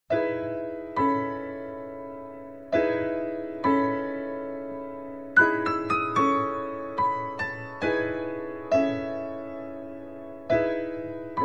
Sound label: Bell Song